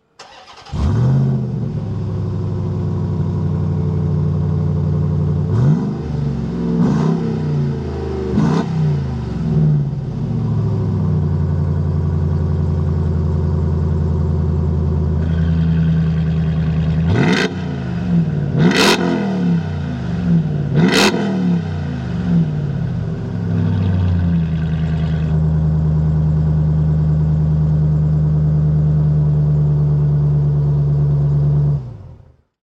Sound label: TRX, ram 1500 trx